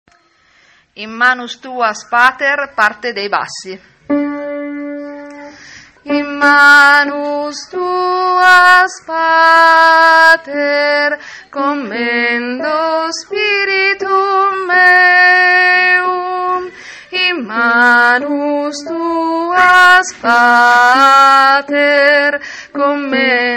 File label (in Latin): in manus tuas pater bassi